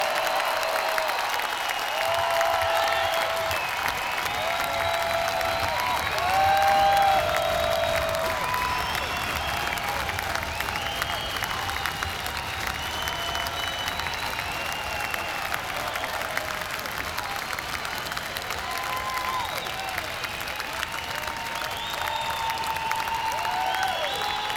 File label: Encore Break; sony